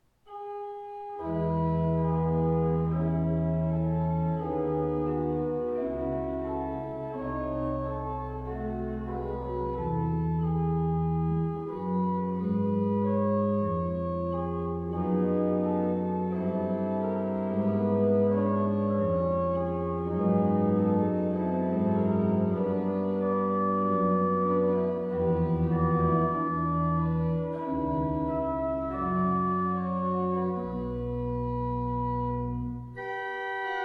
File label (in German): Orgel Eingangsstück; Weihnachten2020; ev-kirche-rothenburg-ol